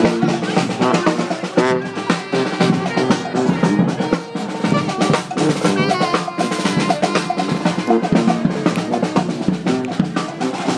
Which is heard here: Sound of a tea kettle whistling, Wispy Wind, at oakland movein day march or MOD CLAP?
at oakland movein day march